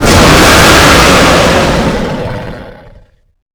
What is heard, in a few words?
dragon threat short